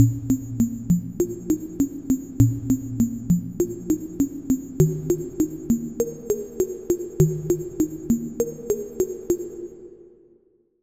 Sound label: pluck dissolve, Sylenth1; Routed Sound; Sylenth1 Expansion - Street Vanquisher Vol.1